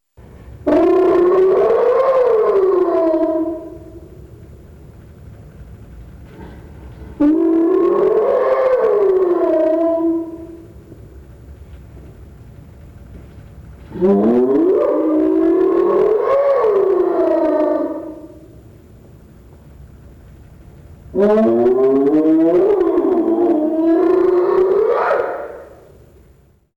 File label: hyena slow whoop